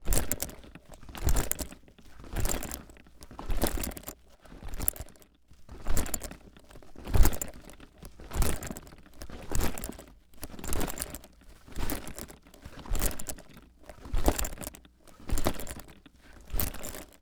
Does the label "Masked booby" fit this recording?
No